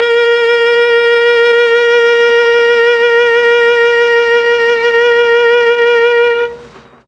A#3 VIOLA, Mellotron; Mike Pinder - Mellotron Archive CD

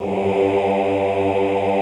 OHH G1, Classical Choir; Best Service; Extended Classical Choir

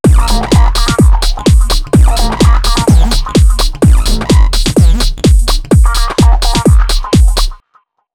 127 BEAT, Fresh Disco House; USB Soundscan vol.20; AKAI

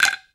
ONLONG, World Percussion

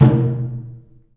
DRUM; The Piano; InVision Interactive

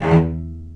Spicato Basses & Celli

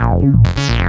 ARP 135, House arp loops; Best Service ProSamples vol.10 - House; AKAI

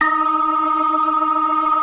DRAWBAR VIB, Synth and Organ

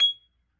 G#5 PRC, B3 PERC; Big Fish Audio - First Call Vintage Keyboards

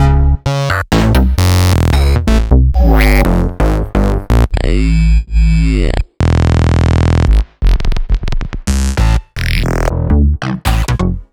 Synth Basses; Sliced

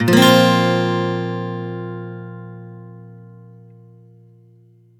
One Shot Bb sus9 downstroke, Acoustic Guitar; Sonic Foundry (Sony Creative Software); Songwriter Acoustic Guitar Companion

